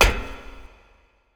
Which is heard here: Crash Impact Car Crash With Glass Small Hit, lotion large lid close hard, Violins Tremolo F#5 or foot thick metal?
foot thick metal